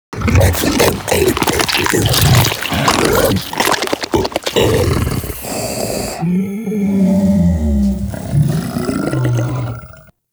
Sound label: Truffle Eat; Warframe; Infested; Juggernaut; Truffle Hunt